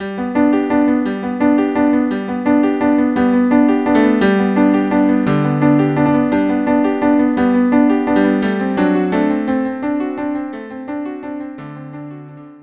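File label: violin viola cello trio; Va Pensiero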